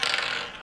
ball start, roulette; frenchroulette3

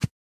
win presentation card hand glow; arcane

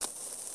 light Fuse; reef raider

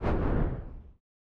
feature splash tab switch; arcane

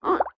duck Hover; scruffy duck

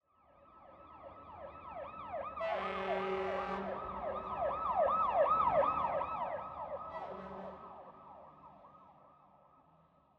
ambience sirens; arcane

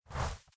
Pop Up Disappear; fruitshopmegaways

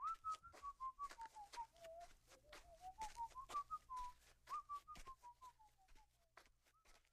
ambience Mex Walk; colossal pinatas

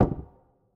door Slam; the wolfs night

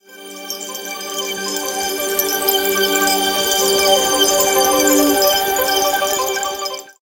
count up sparkle; dazzle

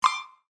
game Settings Button Click; east sea dragon king

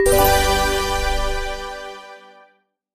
Winning Symbols Blast; dazzle

